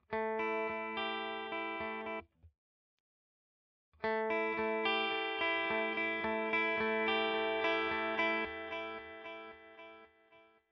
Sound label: Walrus Fundamental DELAY Digital